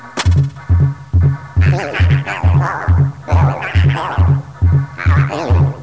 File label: Weird monsters noise; GAMECUBE; LUIGISMANSION